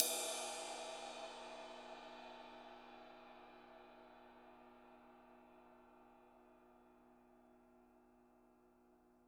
CYM; Bob Clearmountain Drums I

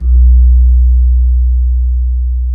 KEY GONG, Bronze Gamelan; E-MU Formula 4000 Series Vol. 4; Earth Tones

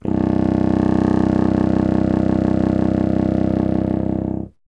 C. Bassoon, solo instruments

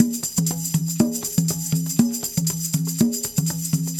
Percussion, R&B Drums

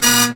Cheering; Mario Paint

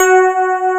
FM G3, ELECTRIC PF, Piano; AKAI S6000 CD-ROM - Volume 1

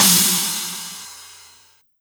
crash, Dance Mega Drums; Best Service; CRASHES

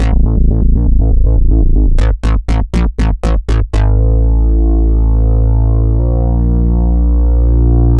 Bass Line; Eclectic Electronica

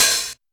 TR909 OHH; Roland SH-32

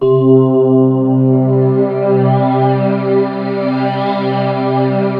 FILM PAD, ENSONIQ TS-10; Rubber Chicken Software Sample Bank

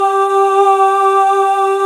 Male Ooz Az; 90s Sample CDs; Club-50 - Foundations Roland

